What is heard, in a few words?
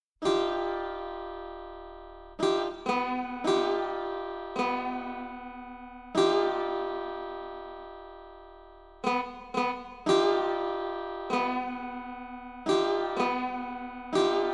Koto